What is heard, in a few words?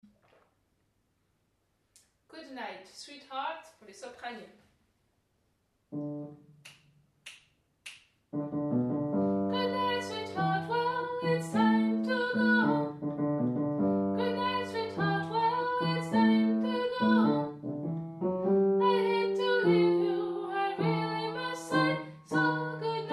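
sop, soprano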